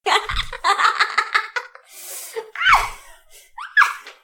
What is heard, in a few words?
giggles and laughter; SyrinScape